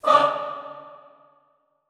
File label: FAH D3 L, Extended Classical Choir; Best Service